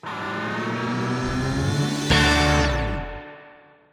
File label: Race Stinger; Disney Infinity